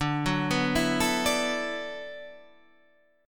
D 6th Add 9th D, Guitar, Drop D

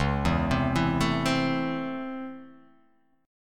C sharp Minor Major 7th Sharp 5th C sharp, chord; x,4,2,5,4,4,2,x

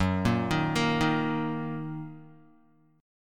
Fsharp 6th down Guitar; F#6 arpeggiated

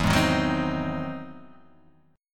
E Augmented Major 9th, Guitar, Half Step Down

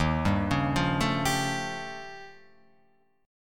D sharp 9th Flat 5th D sharp, Guitar Open F; D#9b5 arpeggiated; JGuitar

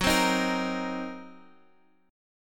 F sharp 6th Flat 5th, down

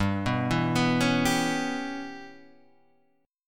G 6th Flat 5th, Guitar, DADGAD

